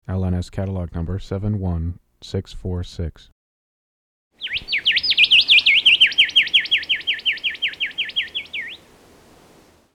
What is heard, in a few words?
Pachycephala Rufiventris, bird species; Rufous Whistler